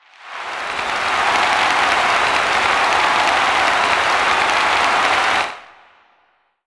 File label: Ensoniq ZR-76 Applause